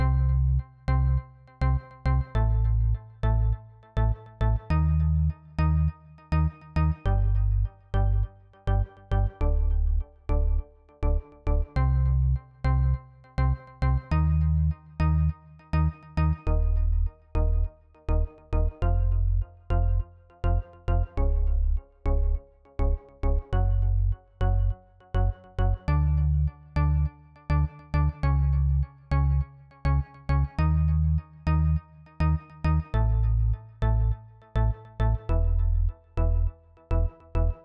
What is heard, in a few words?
Dholki Beat